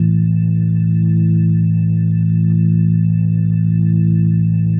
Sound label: Single Note A1, Organ; Sonic Foundry (Sony Creative Software); Joe Vitale Organ Donor